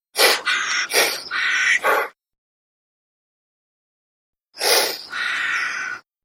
chameleon sound; animal sounds

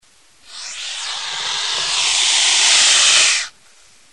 boa; animal sounds

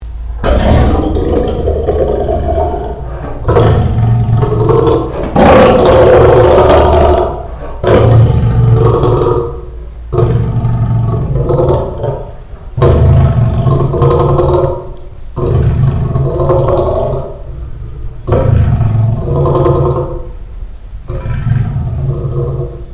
lion snarls; animal sounds

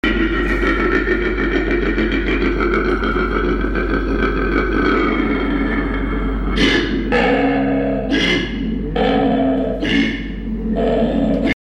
koala; animal sounds

